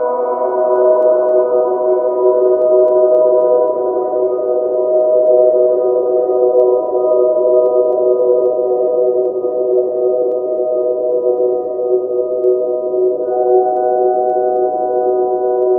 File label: pad suspend; Sonic Foundry (Sony Creative Software); Ugly Remnants VOL-2